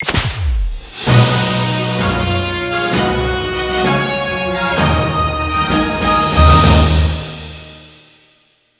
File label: bet Slip Win; netent